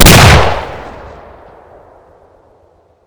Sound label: slugger shoot; sven; ragemap2022